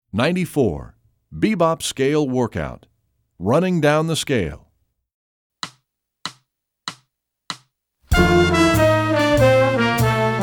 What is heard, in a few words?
bebop scale workout